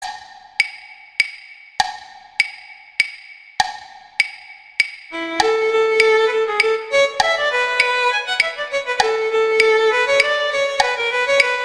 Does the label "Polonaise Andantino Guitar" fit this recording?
Yes